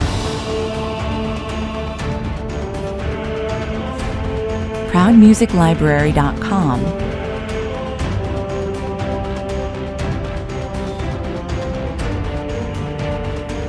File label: with choir